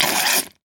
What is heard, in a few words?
water movement wet squirts